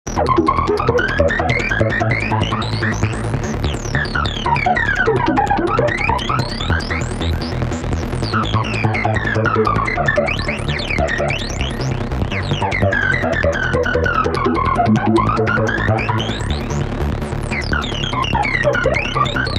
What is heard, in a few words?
Acid effect rack, goa trance bass